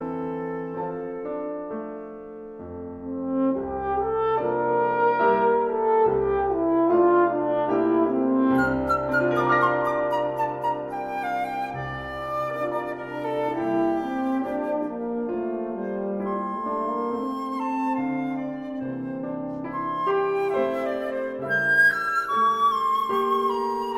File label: for flute horn and piano; invocation